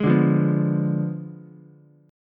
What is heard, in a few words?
F Diminished 7th F down Ukulele; Fdim7 strummed; JGuitar